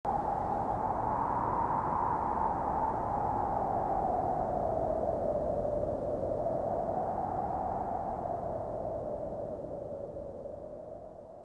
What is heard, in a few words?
Wind